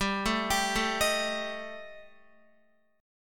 G Suspended 2nd Sharp 5th G, Guitar, 4F, 4A, 5C, 5G, 5C, 6E; arpeggiated; x,10,9,12,9,11